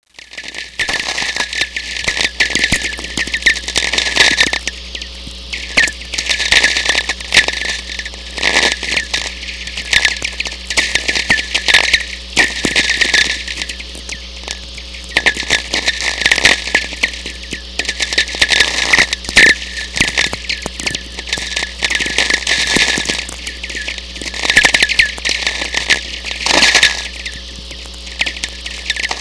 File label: tweeks; Natural VLF Radio Emissions